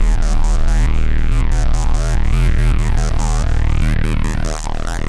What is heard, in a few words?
acid loops; 8packs; Wiretripn; Trinity